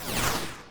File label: MOVE; C64 Dreams; Multi-Sound Default